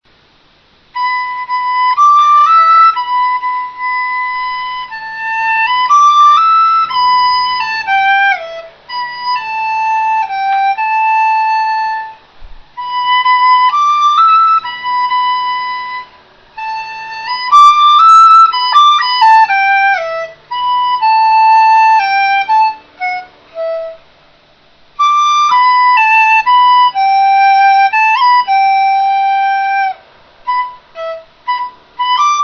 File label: dizi